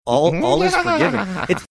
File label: giggling funny